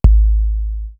rap kick; East West; Global Sounds

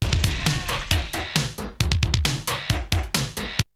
Drumloop; ZERO G - Datafile DISC 3

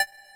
TECHNO PEGG; Pro-Rec - Synthsonic; TRINITY

